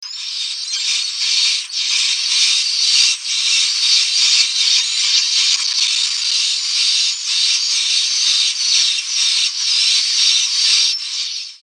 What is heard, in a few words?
group synch call, vswift